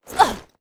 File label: monster; ARCANE